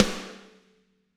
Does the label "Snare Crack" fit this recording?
Yes